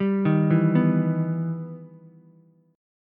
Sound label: E Minor Major 7th, Ukulele; arpeggiated; JGuitar